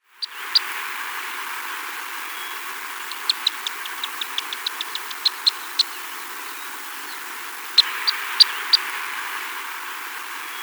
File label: Black Swift